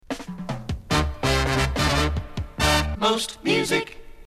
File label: Jingles; 250 Jingles Vol 1 More Music